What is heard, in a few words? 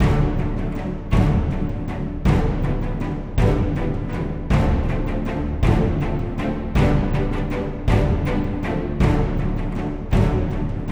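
Staccato Spiccato Loop 160BPM; Cinematic Trailer Music; Stacc & Spicc Loops; Bonus - FX & Transitions; BlueFX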